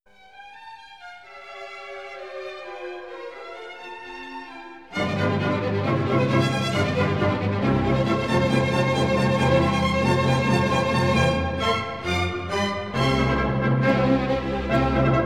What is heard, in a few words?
Symphony No. 95 in C minor fourth movement; Haydn; Legend of Galactic Heroes; Galactic Empire SIDE